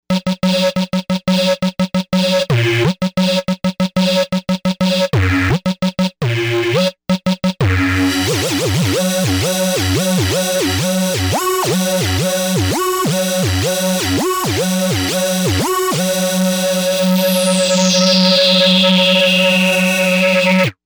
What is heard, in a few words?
Seq, Novation UltraNova; Factory Patches; Touch2Seq